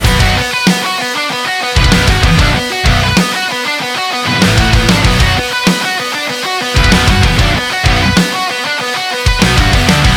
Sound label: modern metal writing